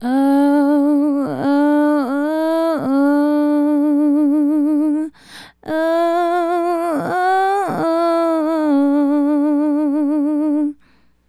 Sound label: Female Solo, Hip-Hop and RnB Vocals